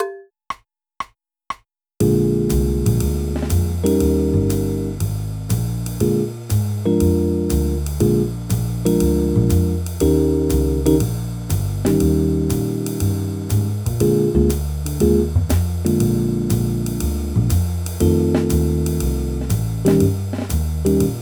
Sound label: Blues Simple